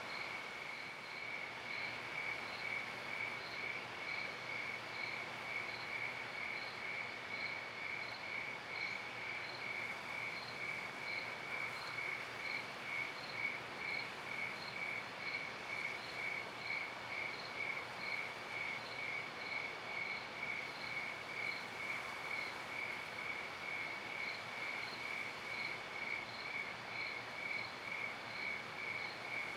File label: Ambience night plains; sim city societies